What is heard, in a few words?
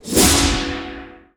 BOSS Atk; Fabao